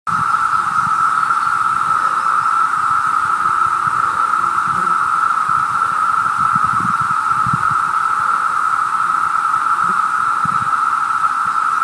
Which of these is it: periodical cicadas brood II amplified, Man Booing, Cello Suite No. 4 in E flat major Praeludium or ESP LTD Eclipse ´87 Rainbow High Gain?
periodical cicadas brood II amplified